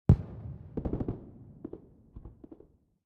ambient port; World Of Warships; port3d

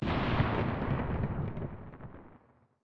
firework; War Thunder

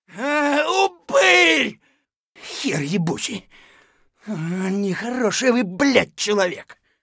hurt neardeath; Escape from Tarkov

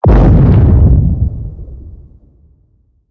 depth bomb; World Of Warships

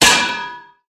junk fall; guns; tanks; War Thunder

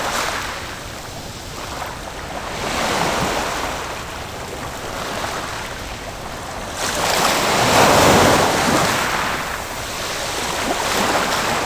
Ambient Coast; DCS

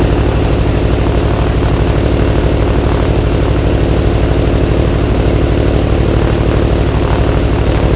hellcat side, engines; War Thunder

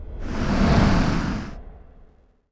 drone break patrol

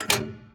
gun reload; World of Tanks

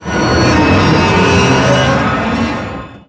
damage vehicle; World Of Warships

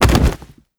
ground fall; Rust

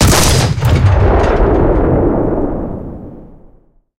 cannon 75mm kwk40 shot, tanks; War Thunder

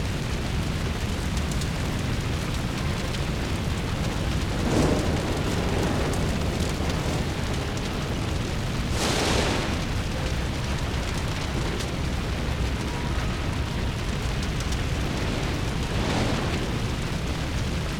damage vehicle; World Of Warships

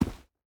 footstep boots dirt jump land; Rust

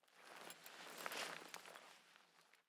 gear movement long slow; Rust

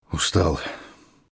tired, voice; Escape from Tarkov

